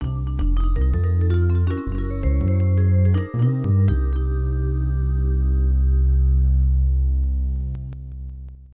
Logo; Street Fighter Alpha 2; Zero 2; CP System II; arcade

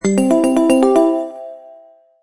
Board Game SFX (Event Type 2); animal crossing amiibo festival

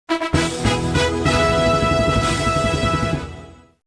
flourish; Hare in the Hat; Android

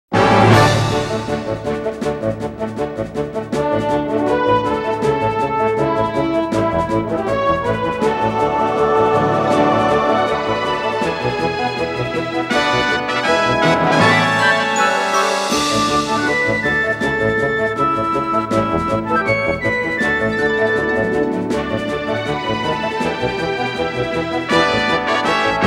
Fantasy Meadows (Grasslands Course); Kirby's Air Ride

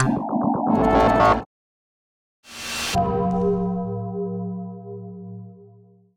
Ui Empire-Play-Btn; Space Tyrant

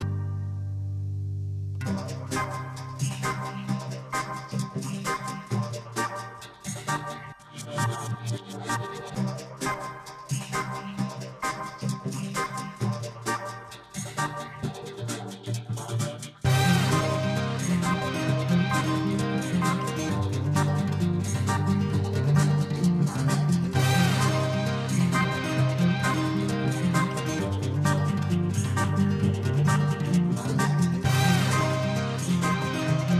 Melody; PixelJunk Shooter; PS3

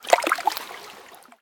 lake; Level2 Ice Fields

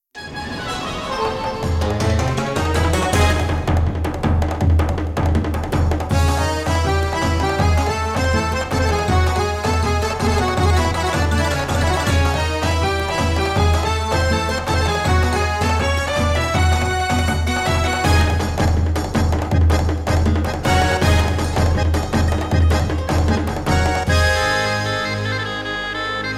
Sand; Kirby Air Ride; Top Ride

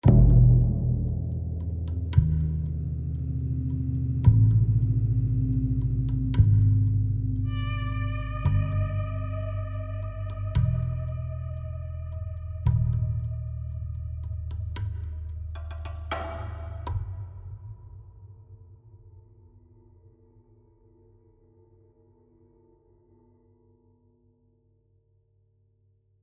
Tension; The Last of Us; AC Tension 6 Low Chunk

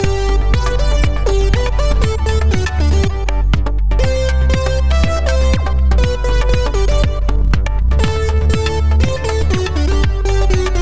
Music Prizewheel; Littlest Pet Shop 3 Blue Team Pink Team Purple Team; NDS

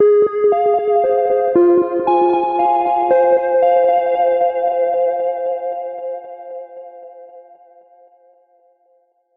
Discover Music

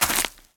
land vines; Scrat

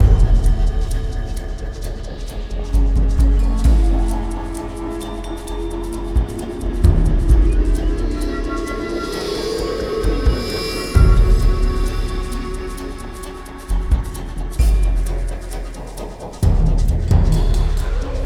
Siege Anticipation Hard; Eden Rising

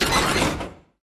Tank Ground slam Lift; Hero

